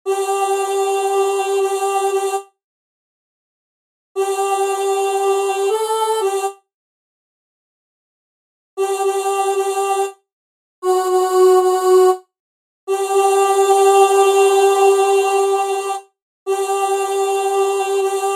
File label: Chorus Voice